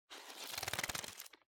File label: Calendar Flick Through